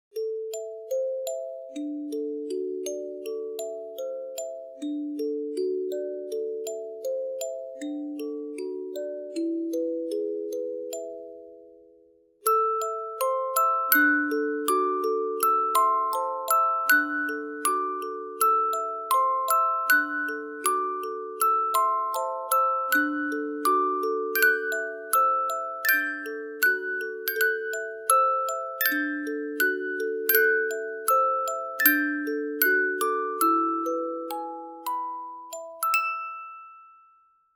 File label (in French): MUSICBOX NOËL